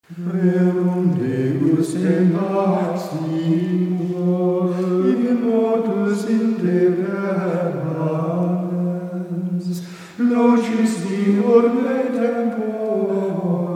Non – Gesang, Gregorianische Gesänge

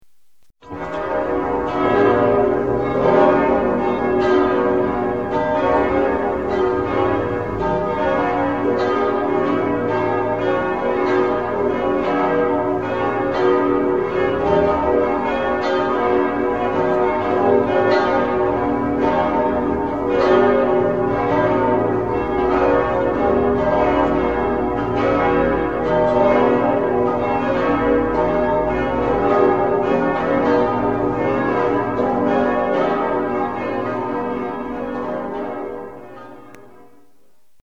Vesper – Glocken; Kathedrale von Zagreb, Kroatien

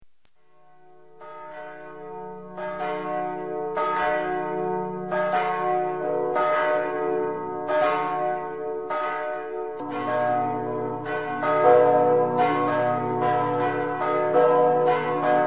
Prim – Glocken; Zuiderkerk, Amsterdam, Niederlande

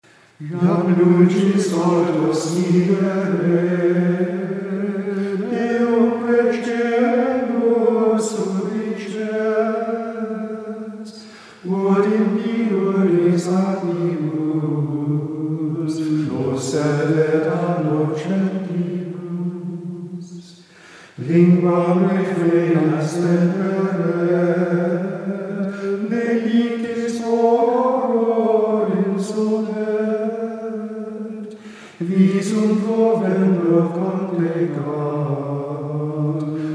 Prim – Gesang, Gregorianische Gesänge

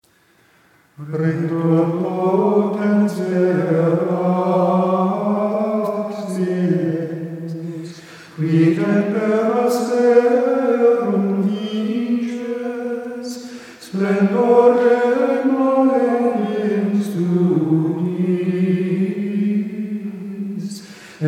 Sext – Gesang, Gregorianische Gesänge

